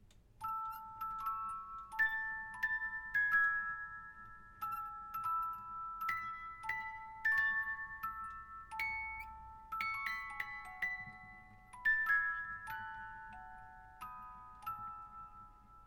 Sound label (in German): Hymne Schweiz